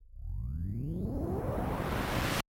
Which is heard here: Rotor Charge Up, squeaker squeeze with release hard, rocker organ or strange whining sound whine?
Rotor Charge Up